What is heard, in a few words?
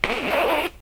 zipper going down; ykk